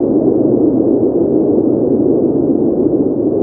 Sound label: wind; nexus tower